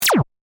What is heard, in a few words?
Laser Gun Fire, Shot; Science, Fiction; Laser Sound Effects